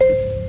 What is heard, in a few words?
bonus right; usa